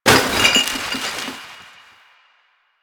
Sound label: Garbage Bag Destroy; Warframe; Ambience; Nineteen Ninety Nine